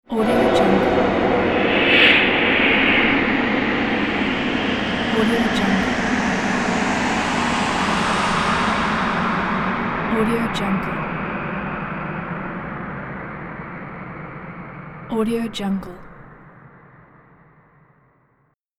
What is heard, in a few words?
the dark winds